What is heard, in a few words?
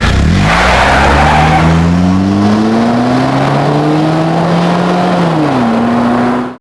car starts off; garage; Max Payne